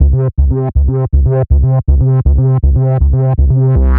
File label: Tech Bass C0, techno 120bpm; musicradar; Bassline